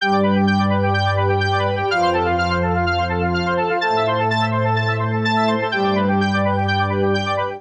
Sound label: Organ (G) 126bpm; Music Loops; St. Krillion Organ; Ableton; Factory Packs; Singularities